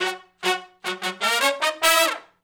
Shuffle Blues Riff (F#) unison, Horncraft for R&B Sections